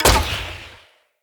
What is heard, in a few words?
Hunter Bow Ranged Shot Charged; Weapons; Player; Envoy; Bows